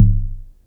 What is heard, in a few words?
SYNCUSSION